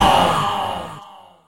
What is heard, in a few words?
ow alter echo; ooh ahh; ow ouch